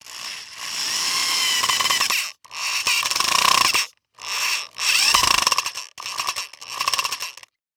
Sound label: Industry Tool Drill Power Drill Drilling Screw Into Wood